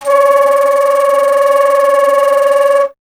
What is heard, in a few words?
Alto Flute